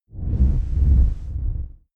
whoosh low tonal distortion

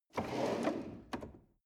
drawer medium close draw short soft

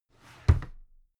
drawer cabinet carton close heavy halfway

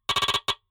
typewriter sequence

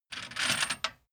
toolbox grab inside

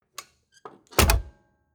tank small hatch close

